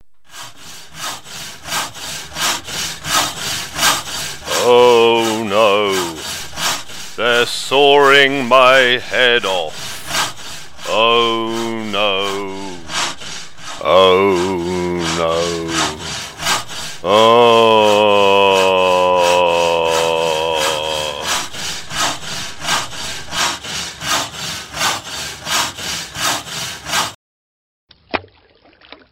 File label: Sawing Off Head